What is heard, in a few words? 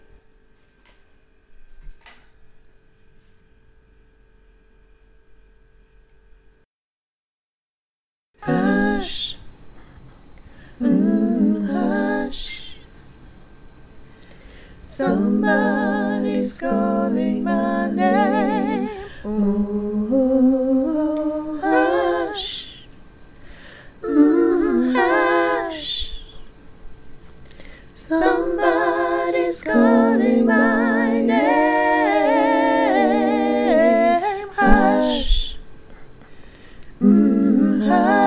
tutti